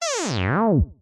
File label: synth bebot sawtooth robot talk; Jessey Drake